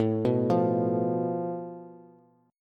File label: A Suspended 2nd Sharp 5th, Bass; Asus2#5; arpeggiated; x,12,9,10; JGuitar